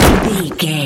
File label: rock impact small hit